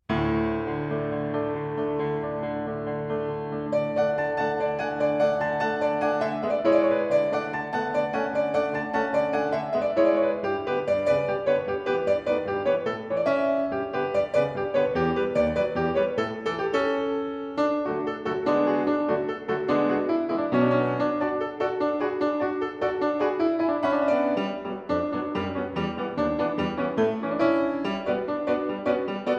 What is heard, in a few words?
Romanian Folk Dances, Romanian Polka; Béla Bartók